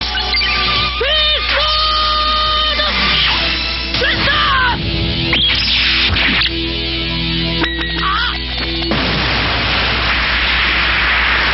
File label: sword blasts